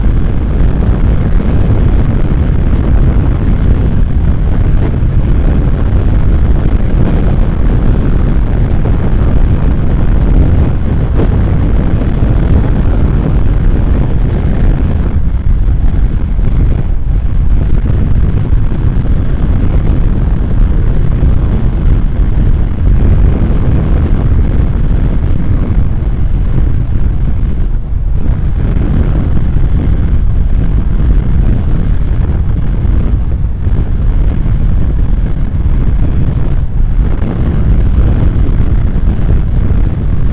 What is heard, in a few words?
hurricane sound